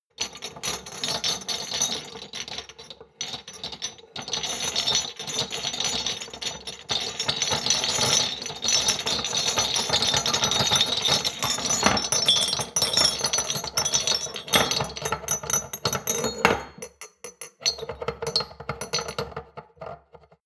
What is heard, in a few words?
shot glasses rattle hard; berufe; gastronomie; soundpool